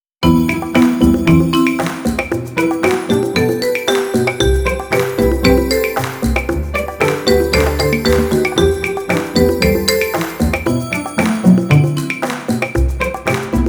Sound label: cheerful entertainment pizz; by burak sound bank; cheerful vol 15